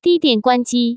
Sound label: battery die; Hisense A5